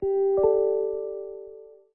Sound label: notif